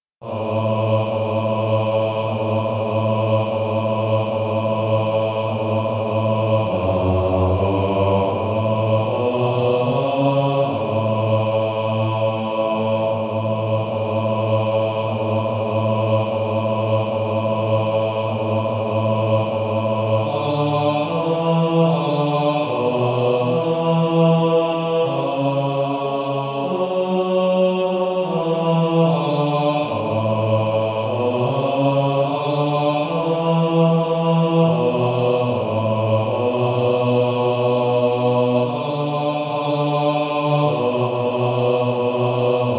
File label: Male Choir; Bass